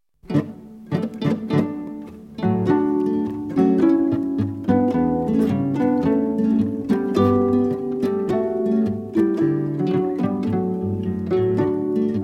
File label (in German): Marsch